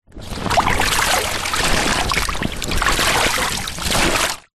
transport boat dinghy rubber small water inside movement